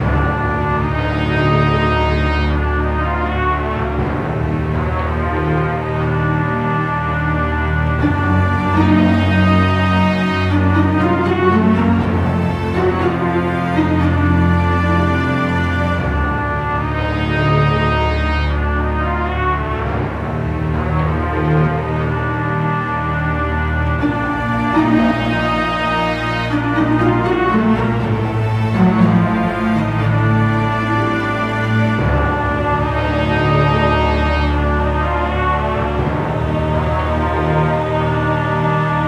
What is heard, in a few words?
Dungeon Level loop; Rezrog